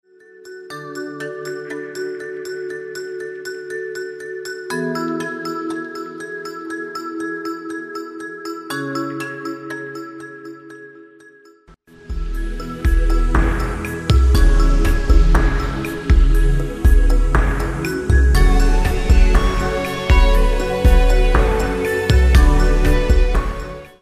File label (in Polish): muzyka bez oplat